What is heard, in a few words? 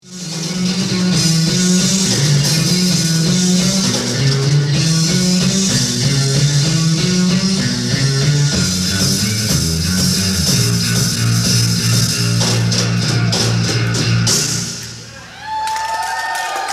Bass Drum Solo